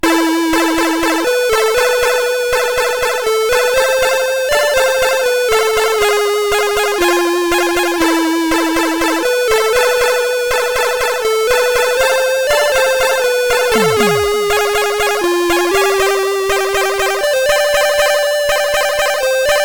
Intro Collection, sid CSG8580R5

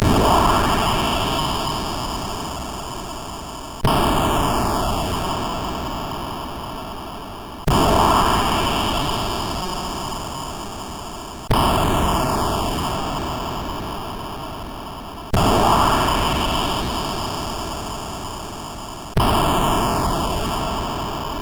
Short intro tune, CSG8580; CSG8580R5; hvsc

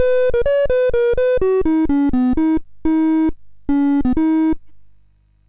MOS6581R2, GAMES; Sammy Lightfoot

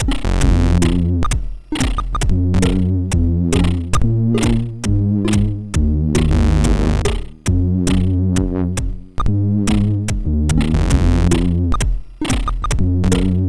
Intro, MOS6581; Pocket Money Intro; MOS6581R4

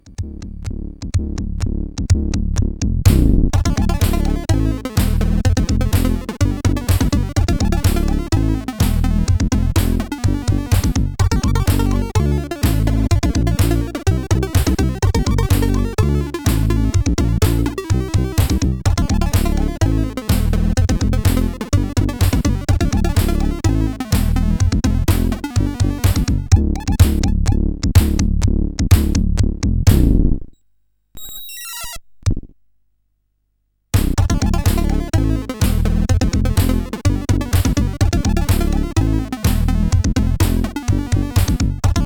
CSG 8580R5

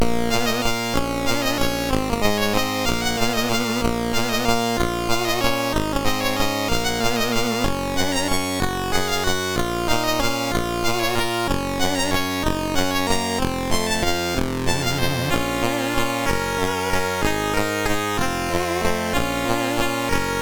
Gothic, sid MOS6581R3; The Dungeon Master

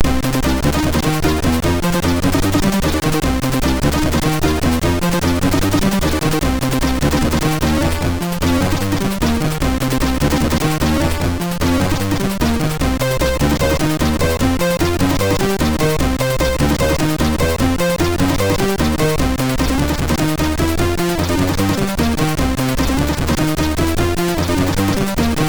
intro, MOS6581R2

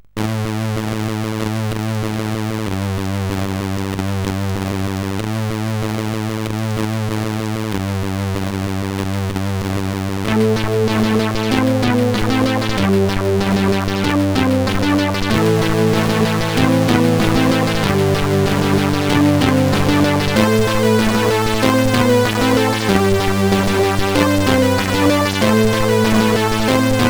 New Digi, CSG8580R5, sid

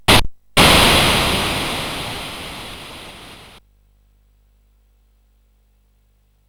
Shuffle BASIC sid CSG8580R5

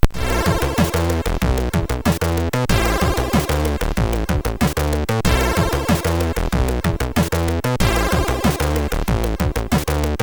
Note Music, MOS6581R3

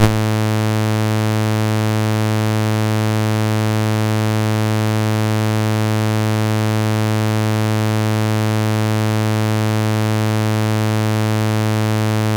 CSG8580R5, GAMES; Witch Switch

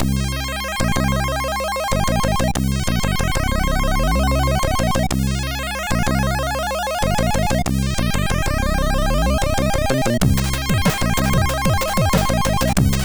sid, MOS6581; La Fusiona de Dangera

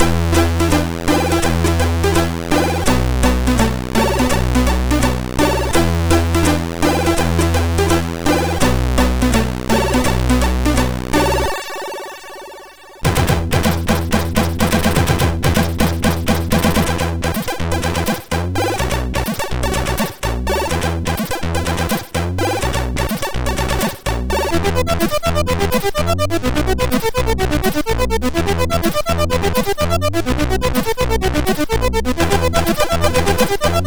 Spinnin Violin, MOS6581R4

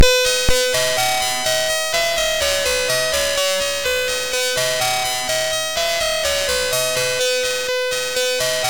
Xylophone, MOS6581R3

